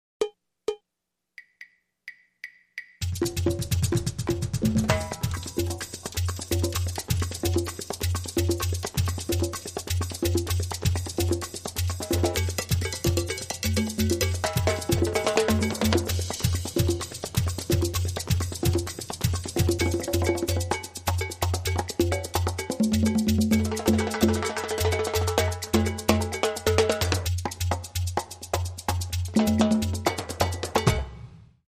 Cuban Loop

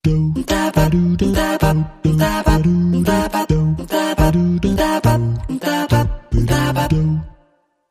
Stinger No Drums

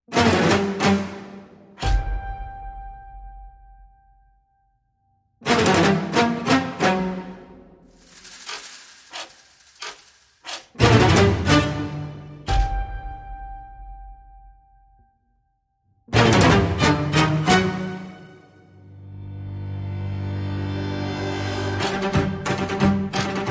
Light Percussion

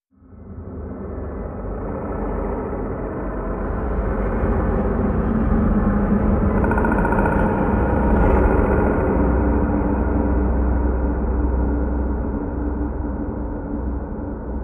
Growl and Groan SFX

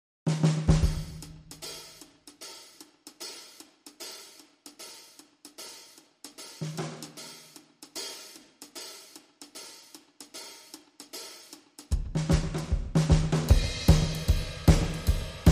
Drums

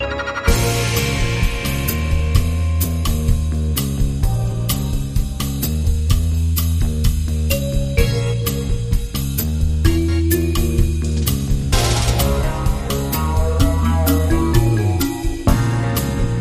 Light Jazzy

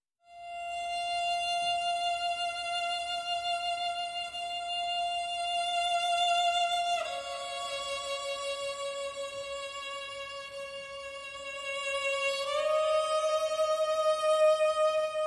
Strings

